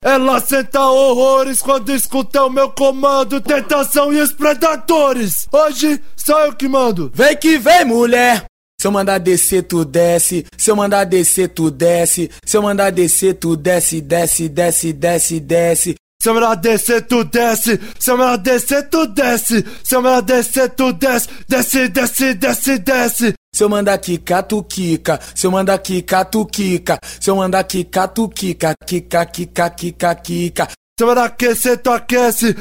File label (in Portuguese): eletrofunk